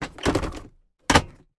van glove box open close mercedes sprinter

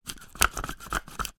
large pepper mill turn grind fast

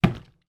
food banana bunch put down on worktop

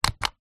technology cb radio talk button press release

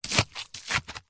wood stick poke into sludge wet mud; foley

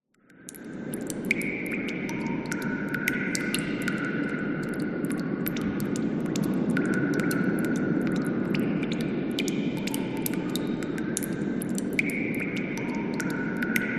nature cave ambience water drips wind outside

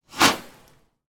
firework small launch eject; sound effects one

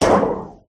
Metal Trowel On Sheet Metal Scrape; sound spark

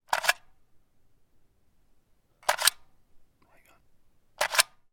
weapon gun ar 15; still north media

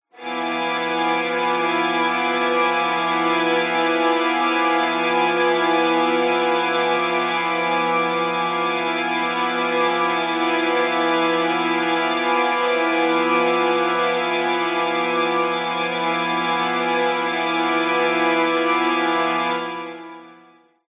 horror suspense strings; zapsplat

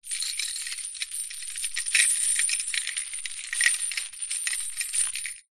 industrial chain rattle movements

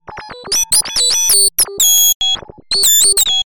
science fiction computer glitch or malfunction

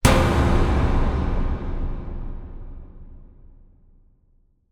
impact metal hit large reverb industrial

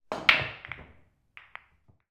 sport snooker pool break med

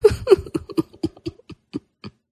human FEMALE sustained cry sob

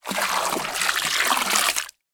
water pour splash into deep; zapsplat

